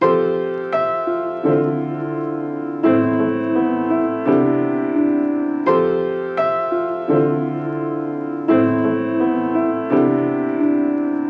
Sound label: 85 BPM A Min Piano; Stems; Cymatics; Cymatics - CHAOS Beta Pack (MIDI + Stems); Broken Record